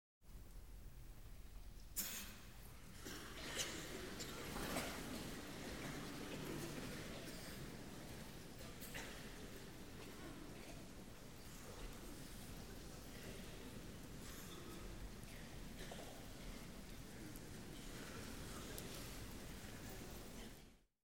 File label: Theatre And Concert Audiences - Applause Between Movements, Royal Albert Hall; Human Crowds, Children & Footsteps